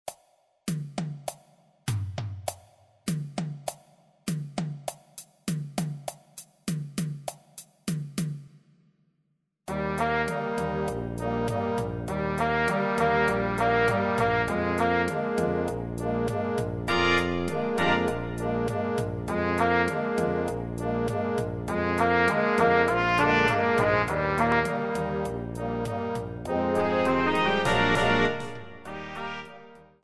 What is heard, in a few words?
Cha Cha Cha